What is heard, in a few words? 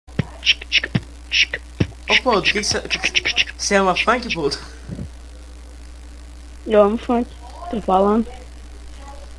funk